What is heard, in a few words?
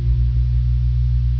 HUM, 机器